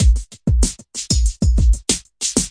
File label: electro hop, drum loops and breaks, 095 bpm; 091 to 100 bpm